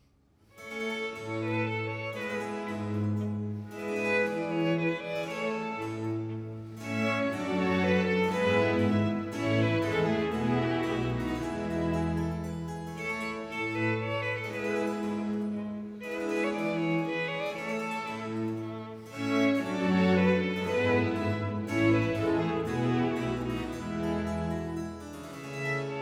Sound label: Concerto Grosso in B flat major; Corelli